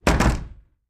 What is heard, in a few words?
Attic Closet Door Clo